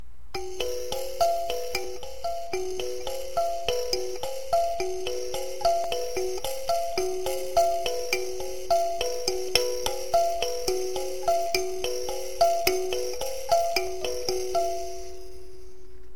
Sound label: kalimba